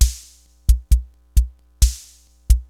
Univox loops; Rubber Chicken Software Sample Bank

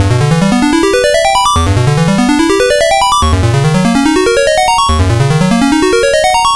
Game Boy Synth

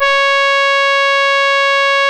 C#, Synclav FM, BRASS; Big Fish Audio

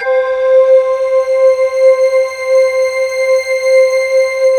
orient C5

